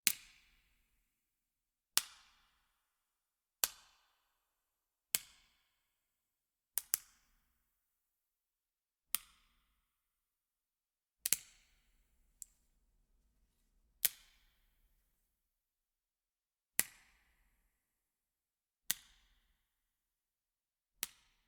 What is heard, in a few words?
stick break, foley